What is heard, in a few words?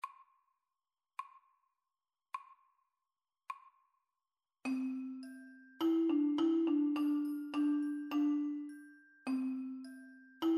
instr marimba feature